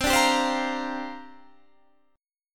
C 7th Suspended 2nd Suspended 4th C down Guitar Standard; C7sus2sus4; strummed; capo=2